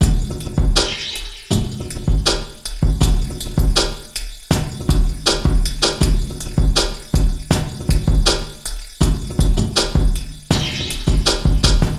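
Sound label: Loop, beats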